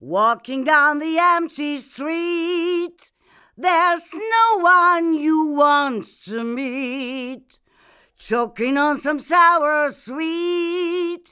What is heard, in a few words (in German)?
Bock 251 Female Vocal V376a Niere 30 cm; Universal Audio